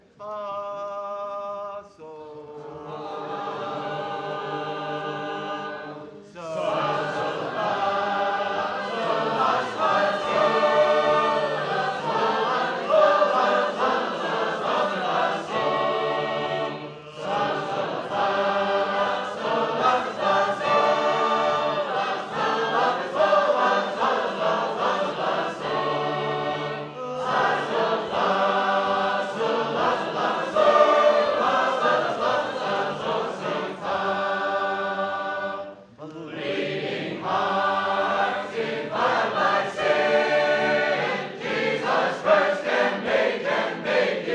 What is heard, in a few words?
Natick, sacred harp